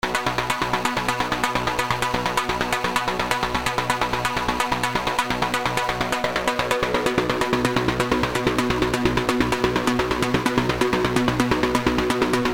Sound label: FX Harmonics; Vengeance Sound Avenger 2; Melodic Tech Voices 1